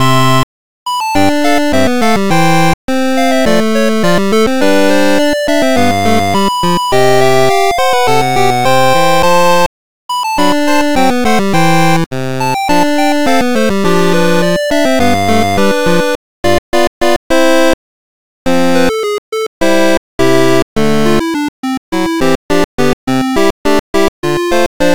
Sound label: 8bit Loop